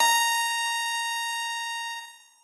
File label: piano; pianojs